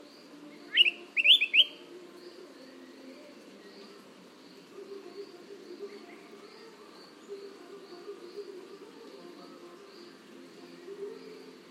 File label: araripe manakin